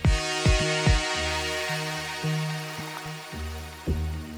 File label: Synth Fade; Pads; BB 2HTC Samples Vol 4 Addendum